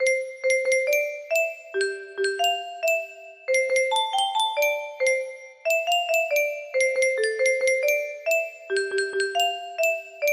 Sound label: music box melody